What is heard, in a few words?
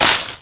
plant collision; Angry Birds Space